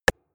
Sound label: Warm Tap